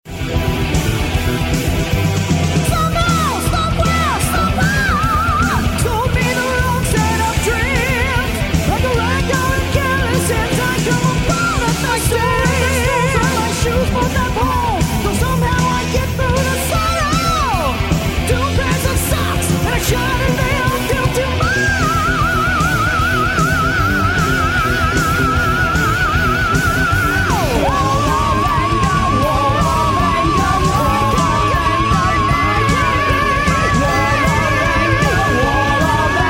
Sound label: Cacophony Of Anger